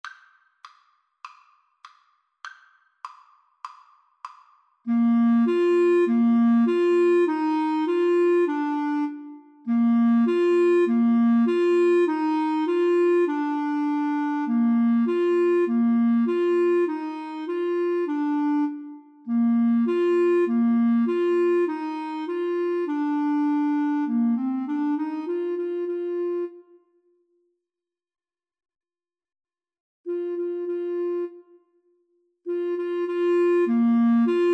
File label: alouette, Flute Clarinet Duet